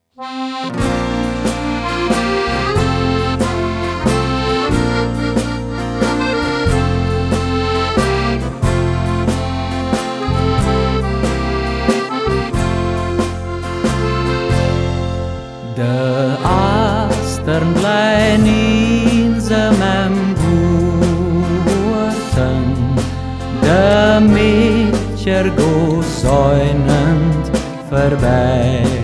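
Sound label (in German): De Astern, Umgangssächsisch; Ortsmundart: Wölz; hegt wird gesangen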